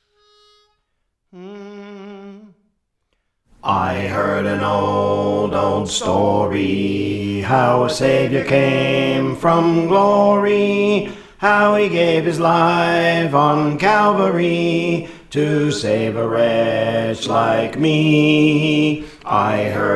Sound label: Bass; Praise For The Lord Training